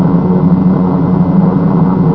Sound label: eng; BOMBER III™ (DEMO)